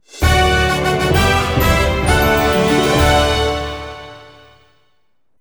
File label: achievement; Digimon World 4